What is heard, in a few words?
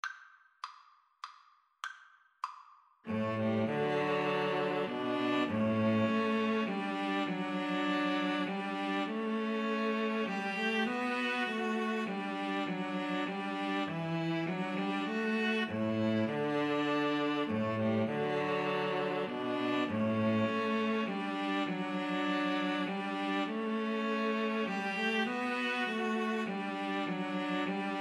first noel, string trio